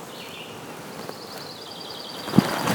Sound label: Pine Warbler